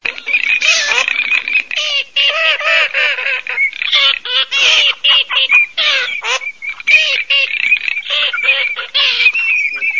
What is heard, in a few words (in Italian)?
ALZAVOLA MARZ VOC